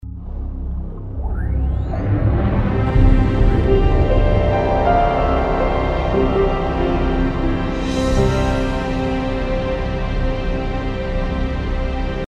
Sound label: jingle